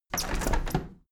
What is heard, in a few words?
window handle plastic squeak open tilt heavy; objekte; fenster